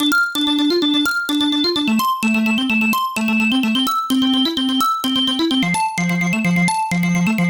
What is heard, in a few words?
Arp Loop, 128 BPM D min, Synths; Loops; House